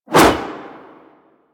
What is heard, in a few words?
Golden Band Staff Whoosh E; Warframe; Monkey King